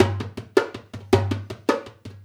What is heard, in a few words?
Dumbek; Essential Percussion